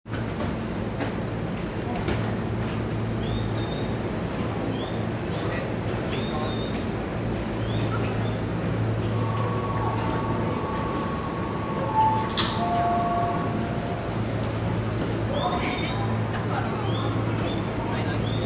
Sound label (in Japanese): 京王線明大前東接近, train sounds